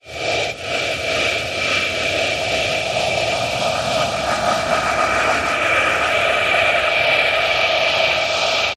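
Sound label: Rise Rhythmic Windy Build Up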